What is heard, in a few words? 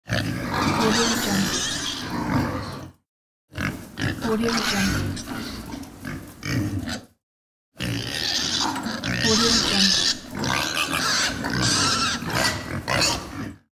pigs